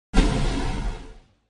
sound attack hit; hitsound

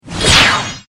flash

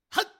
attack, stick

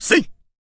attack

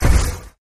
skill bullet

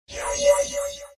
skill bullet; zenmaster

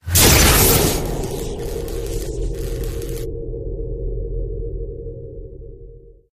Appear

